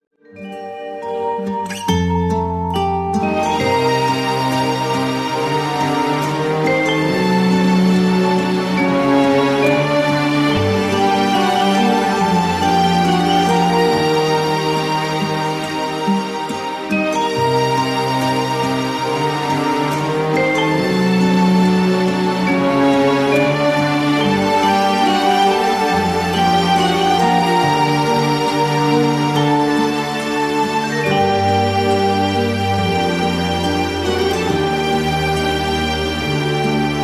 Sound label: brass minus